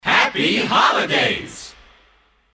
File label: christmas kit group shout happy holidays